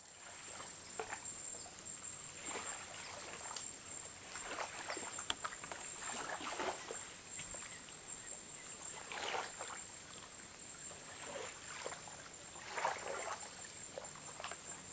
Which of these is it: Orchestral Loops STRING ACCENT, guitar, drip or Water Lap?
Water Lap